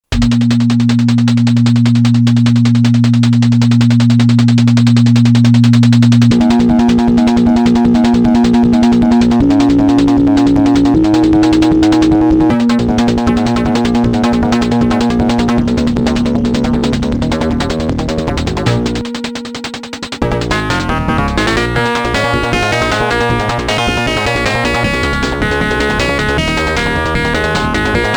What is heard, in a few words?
sonic s100 es1989s